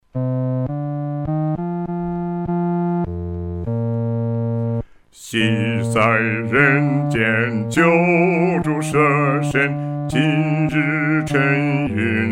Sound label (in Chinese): 独唱 第四声